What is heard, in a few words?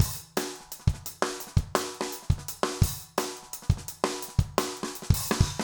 jungle 170